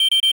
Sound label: System pipipi higher